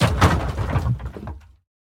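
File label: destructive fall of old rotten boards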